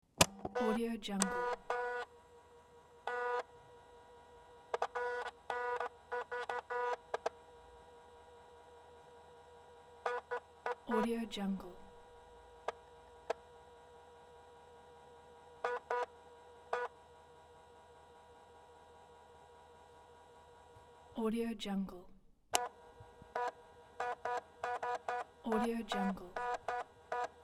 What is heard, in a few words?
floppy disk